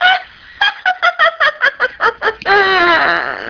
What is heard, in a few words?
witch laugh